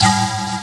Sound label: tttoahhhh; Truth-Hz - 1-Hit KO Sample Pack